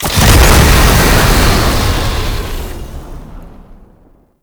Lasrian Tank Effervon Spray Start; Weapons; Warframe

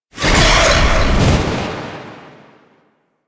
Teleport Out; Warframe; The New War; Archon; Boreal; Abilities

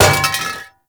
Cannister Bounce; Enemies; Abilities; Warframe; Tau; Dax Archer

